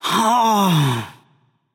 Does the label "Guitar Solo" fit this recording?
No